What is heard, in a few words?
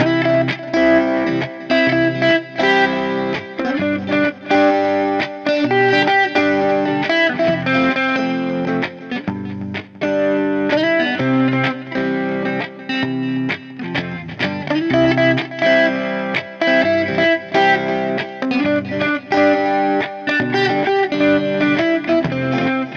Simple Guitar Version